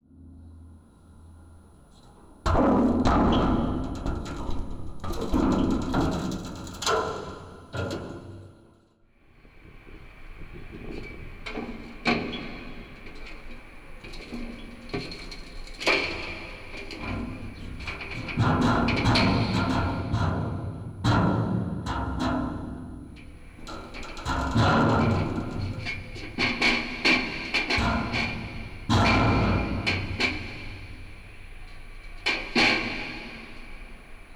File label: Metal sculptures in the street.; Esculturas metálicas en la calle.